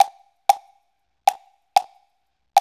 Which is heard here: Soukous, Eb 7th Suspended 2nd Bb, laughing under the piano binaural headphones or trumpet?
Soukous